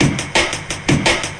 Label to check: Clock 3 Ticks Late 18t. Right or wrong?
Wrong